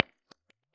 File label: Science 2x15 15PR400 U87, Bass Cab IRs